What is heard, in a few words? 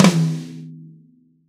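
Reg. High Tom, Roland BK-5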